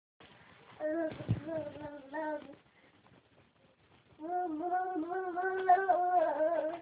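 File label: baby hungry, female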